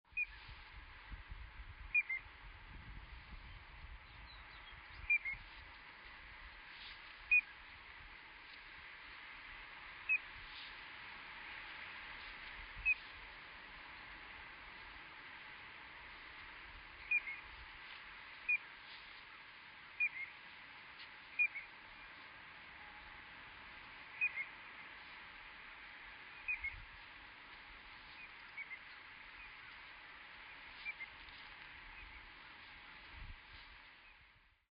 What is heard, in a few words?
Piping Plover Contact Calls